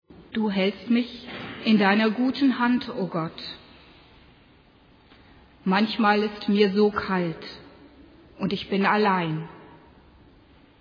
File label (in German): meditation